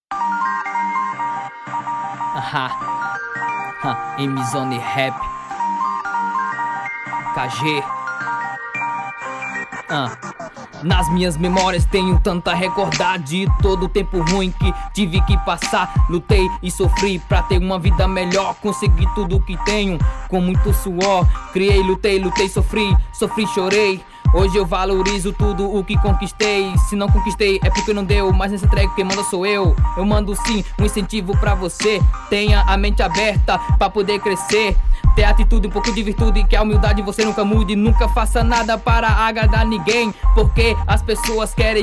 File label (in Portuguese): rap